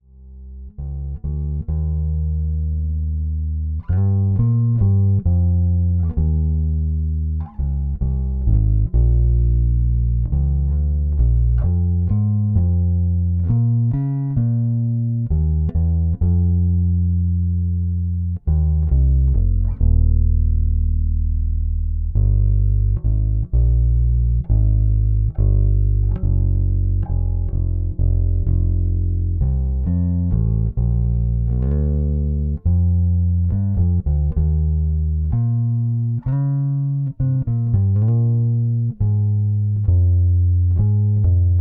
API Audio TranZformer LLX Bass Pedal Jazz Bass Neck Pickup